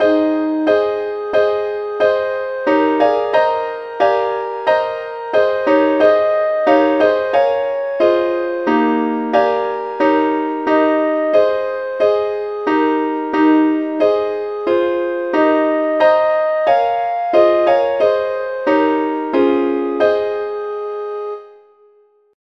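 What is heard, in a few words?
advanced lead, key A flat; SAINTTHOMAS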